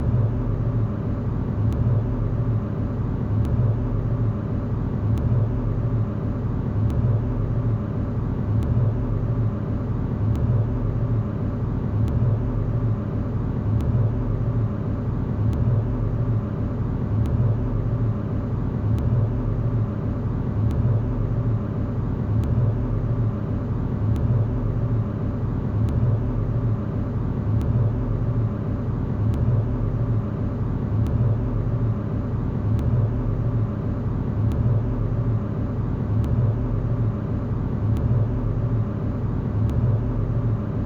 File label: ambiance concrete; noahrav